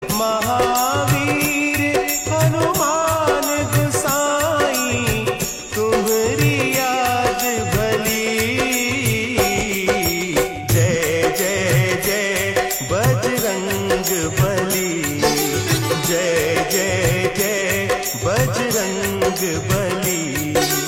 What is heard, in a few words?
Classic Ringtone